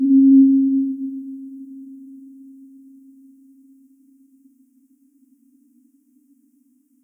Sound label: hum normalised; cccbr; glossop